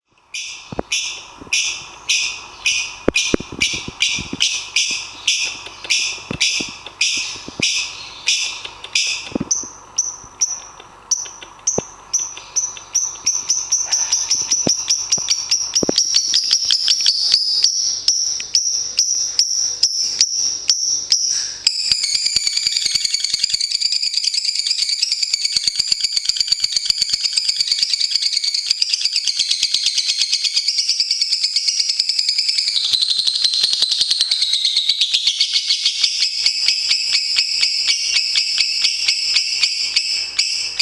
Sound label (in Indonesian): Suara Lovebird; Juara Lomba Nasional